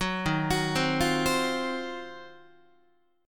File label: D sharp Minor 6th Add 9th D sharp Guitar, chord; D#m6add9 arpeggiated; 9,11,9,10,9,11; 4A,4E,5A,5D,5G#,6C#